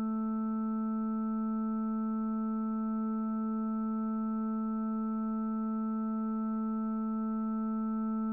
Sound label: phasor A4